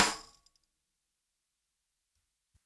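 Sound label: ONE SHOTS SNARE WITH TAMBOURINE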